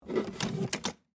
Typewriter Pick Up, Technology, Typewriter, Smith, Corona, Pick, Up, From, Table; Typing; BlastWave